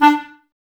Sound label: Clarinets, Wood Winds